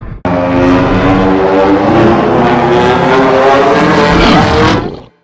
Atomic breath charge

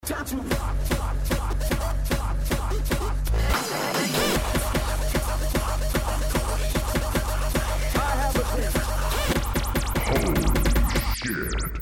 Hardstyle

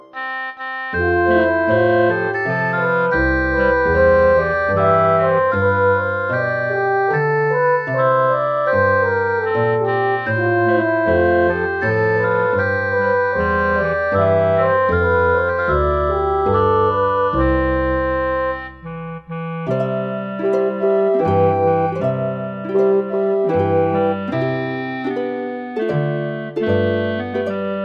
Lullaby

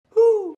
hoot

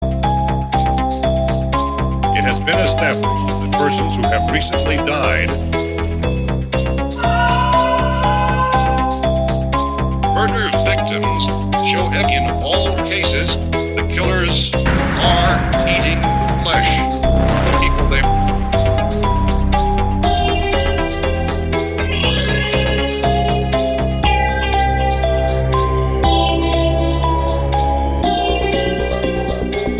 Horror

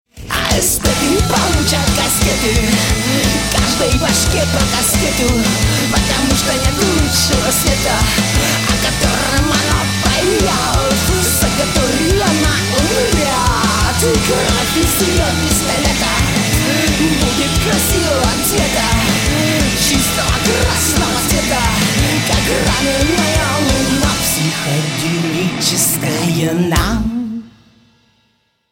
psychedelic disco

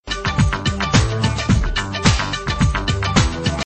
Tabala Tune

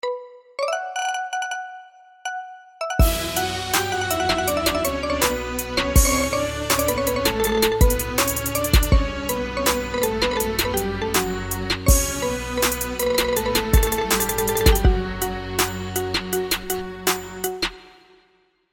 marimba

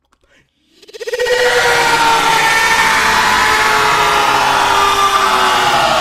Slow Scream; Dashie

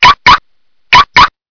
Loud Car Lock

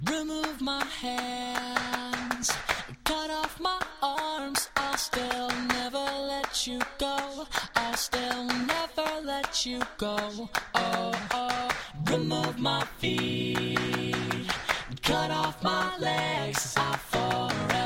Claps And Autotunes

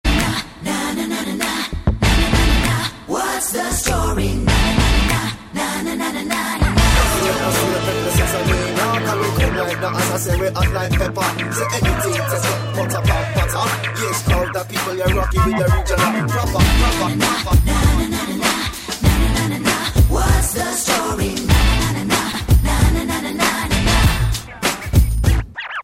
Nice Humming

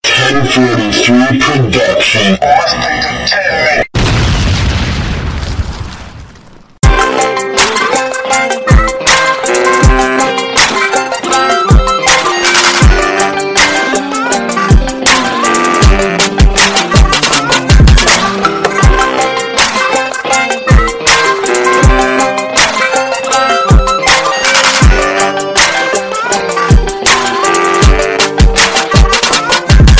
BLUES

